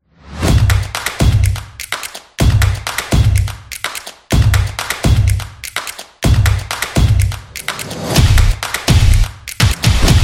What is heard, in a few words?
Clap Drum